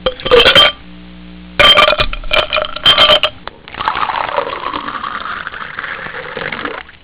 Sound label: ice pour